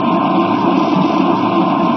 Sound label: scrape metal